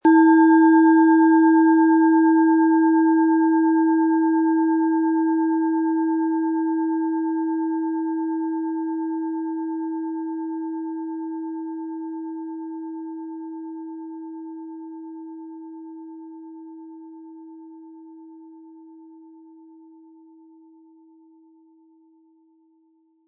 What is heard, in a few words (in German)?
Planetenschale; Alphawellen, Ø 12,4 cm; 260-320; Sound-Spirit Shop